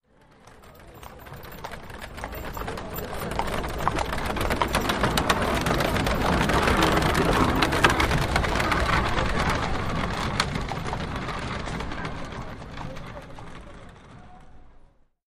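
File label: Carriage Medium To Fast, carriages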